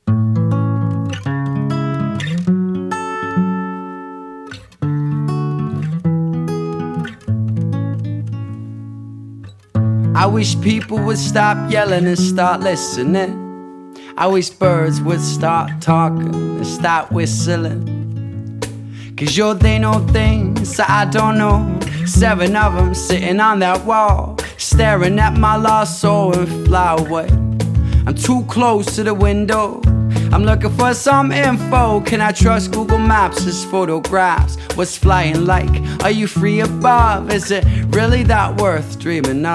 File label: (Acoustic)